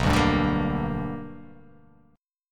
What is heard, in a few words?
B Minor Major 7th Sharp 5th, chord